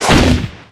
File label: Mortar Shot; Weapons